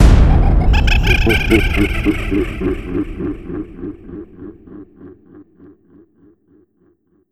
Evil Laughter; Everhood

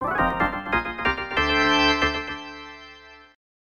Dunk Contest - Success; Dunk Mania; Namco System 11

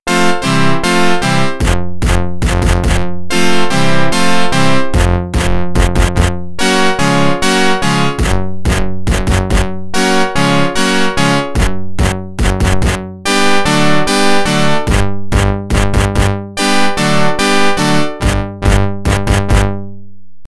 Organ

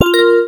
Text feedback

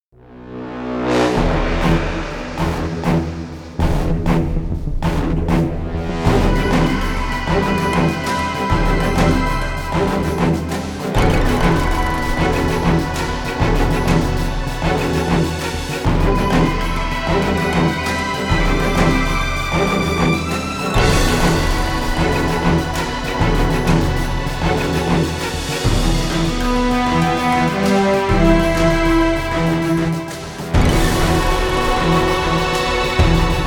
Low Health; RWBY Arrowfell

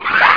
Bruise; Conquer Online 2.0

